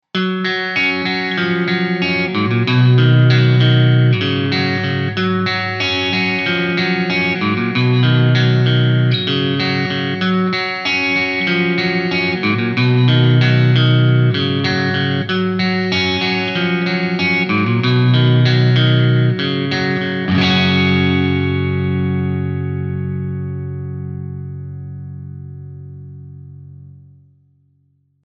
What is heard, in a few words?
tele crunch; Rock; Jacaranda Tele